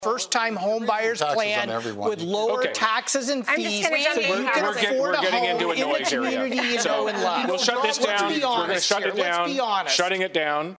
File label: debate noise area; leaders debate; local candidates